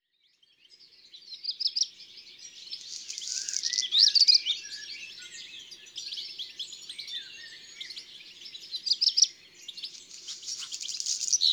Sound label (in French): Rougequeue noir ABC des chants d'oiseaux